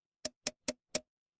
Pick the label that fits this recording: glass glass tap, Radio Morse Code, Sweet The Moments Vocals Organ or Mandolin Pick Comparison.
glass glass tap